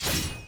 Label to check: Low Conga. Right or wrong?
Wrong